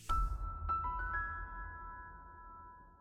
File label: Celeste Piano